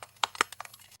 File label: Morning Stretch